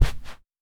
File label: Carpet